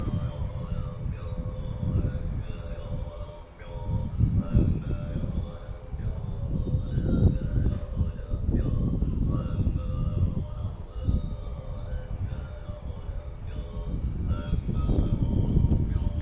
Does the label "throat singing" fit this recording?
Yes